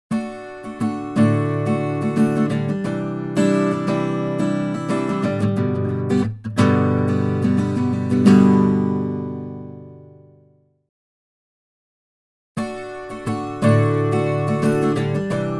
Acoustic Guitar

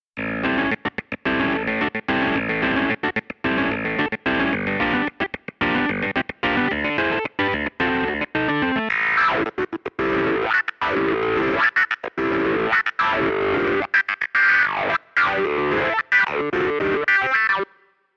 Step Wah

